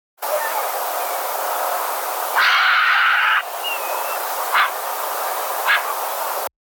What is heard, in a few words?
little egret call